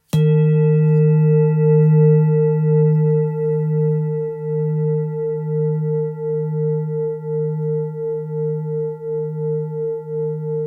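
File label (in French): Therapeute re diese, bols chantants tibétains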